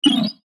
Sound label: Rollover Bright High Pitch Squeak Whoosh